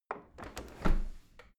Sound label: window handle close; objekte; fenster